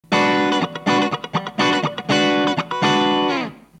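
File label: 4558, CLEAN, RC4558P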